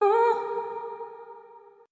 "uh" female sung; ooh ahh; uh um

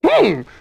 whew; ooh ahh; human

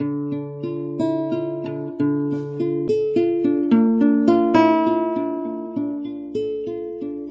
guitar final note

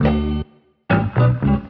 guitar lead me on now; Loops; Phonky

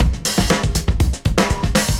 Drum loops, Funky

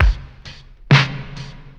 sevicemen, Drum loops Boombap

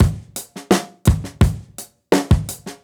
get low, Drum loops Boombap

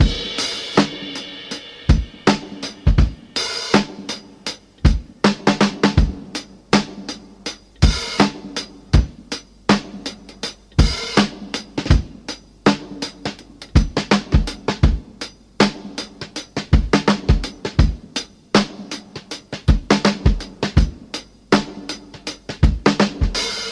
stretched out, drum loops, funky

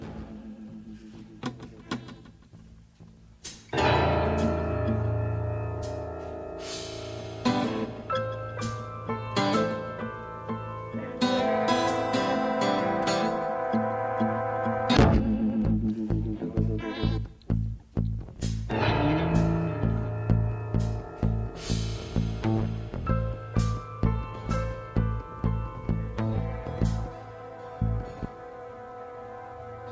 guitar, Loops, Sad

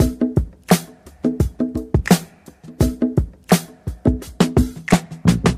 easin in, Drum loops Funky

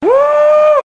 woo

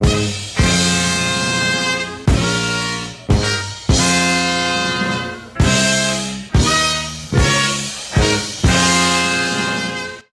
horns funky stabs, phonky loops

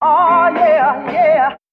"ah yeah yeah" SUNG; ooh ahh

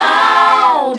"ow" SUNG

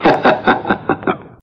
laugh kung fu scratch; ooh ahh

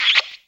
kiss

